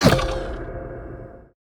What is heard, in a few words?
flag shot